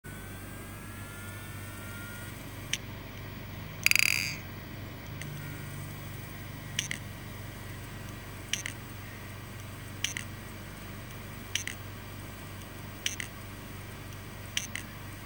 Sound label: Heads Seagate 3.5 500GB 7200, damaged hdd sounds